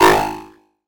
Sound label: sound design harsh robotic burst